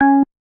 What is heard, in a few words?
bass, programmable speaker